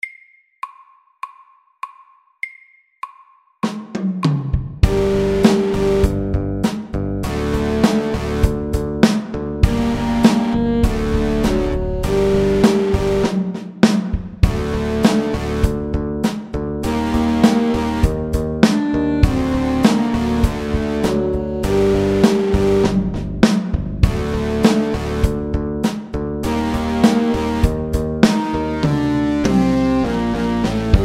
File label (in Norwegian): Elektrisk rock MIDDELS